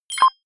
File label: notification bleep; signale